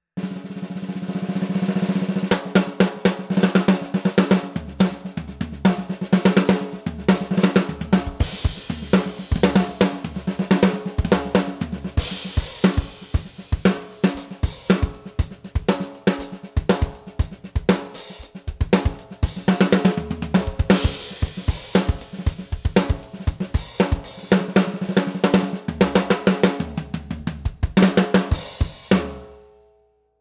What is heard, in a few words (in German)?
Protean mittel offen